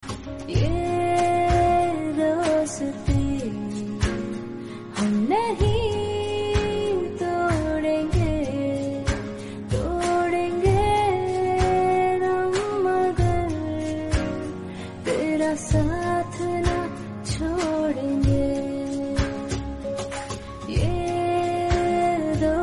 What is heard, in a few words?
female